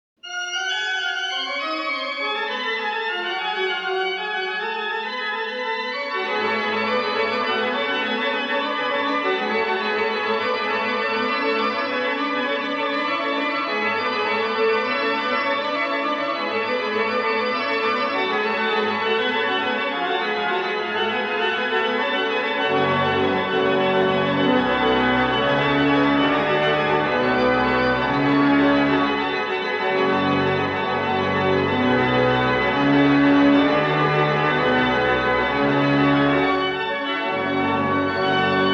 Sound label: Prelude and Fugue in G minor Prelude; Buxtehude; BuxWV 149